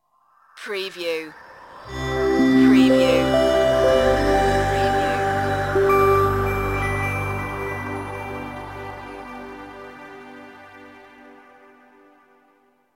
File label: idents